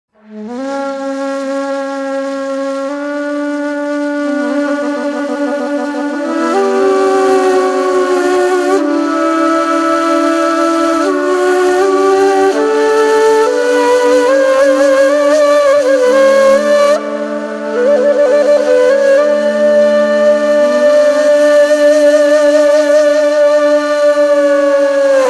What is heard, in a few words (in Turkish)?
Ney Taksimi & Ezan